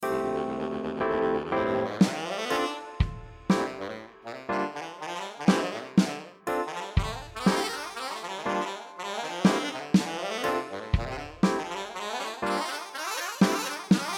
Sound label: sax; SaxBand Medium Bundle von C Music Production